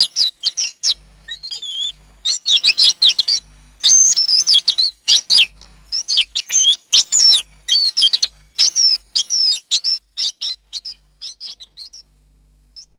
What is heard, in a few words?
high pitched squeaky finch chirps; birds